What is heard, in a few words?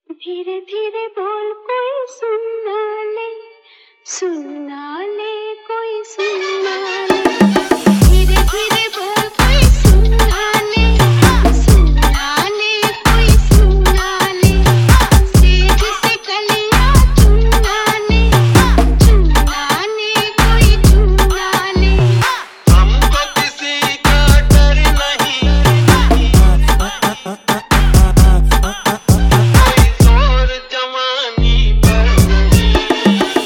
brazilian funk mix